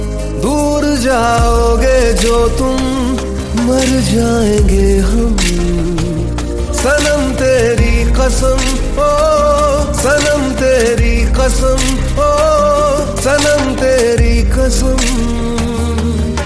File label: Hindi Song Ringtone